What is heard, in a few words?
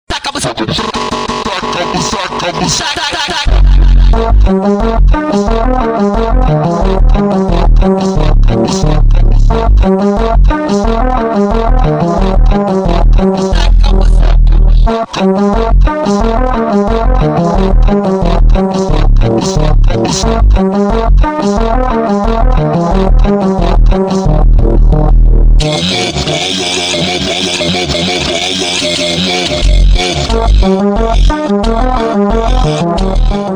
funk mega slowed